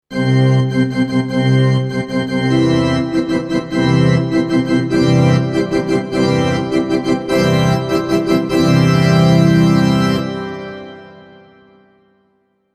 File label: Wedding Intro, Classic church organ wedding introduction.; Musical Sound Design